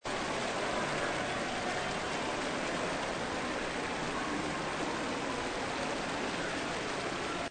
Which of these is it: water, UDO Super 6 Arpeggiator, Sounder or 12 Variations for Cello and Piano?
water